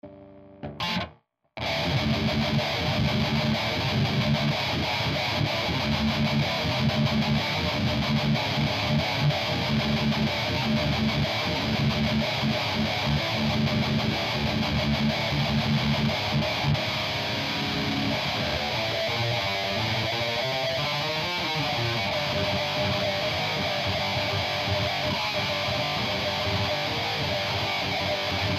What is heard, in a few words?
riffs